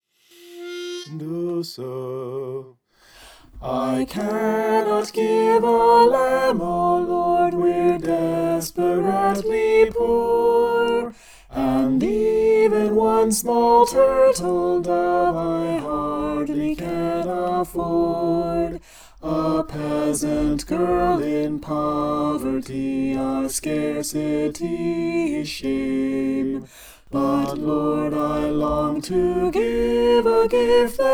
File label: soprano